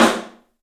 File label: STEEL, Drums, ROOM SINGLE; 3D Sound